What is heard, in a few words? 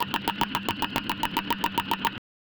loop 110 bpm fast, cards; 3s